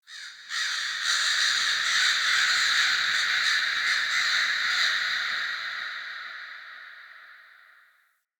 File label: Day Bird Of Prey, Ambience